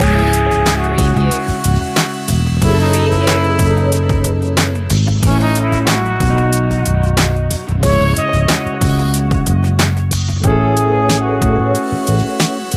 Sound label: jazz loop; chillout grooves